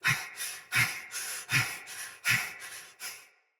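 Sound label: Breath Soldier Mace Agent Female; Battle; Sinecure Melee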